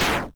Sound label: Hit Weak; openbound